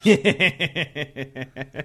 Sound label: awesome laugh